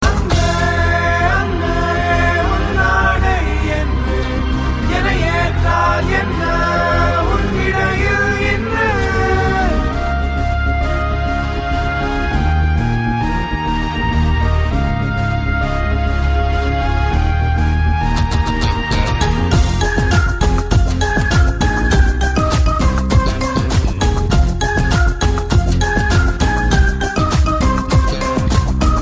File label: bgm; heena; alternative